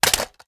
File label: reload empty magout, weapons; gmod; viper